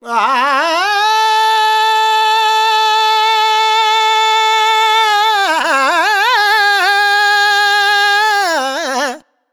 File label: Vox, Vocals Male, World Pop; Sonic Foundry (Sony Creative Software)